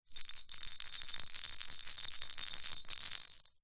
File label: foley plastic cat balls bells x2 shake